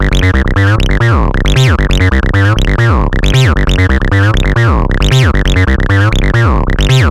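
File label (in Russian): эйсид хаус мелодичный монотонный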